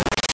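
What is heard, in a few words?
machine gun Shot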